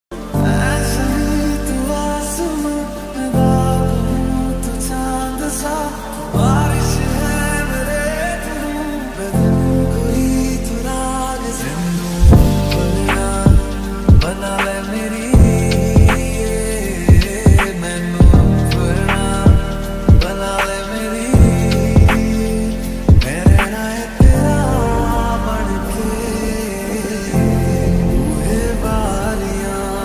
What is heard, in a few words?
lofi hindi ringtone